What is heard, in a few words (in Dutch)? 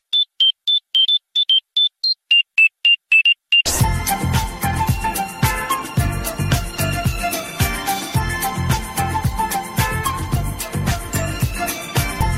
hoortest ringtone; doe de hoortest